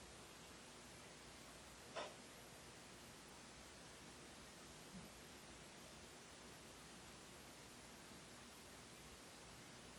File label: Inside Tattoo Shop